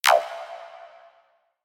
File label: Electrodrip Tone E4